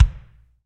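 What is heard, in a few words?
bass drum, Roland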